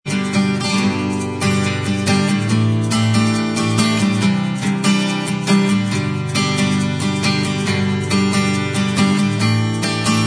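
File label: acoustic, instrumental track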